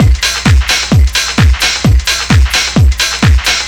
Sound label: rattle it, Deep House 130bpm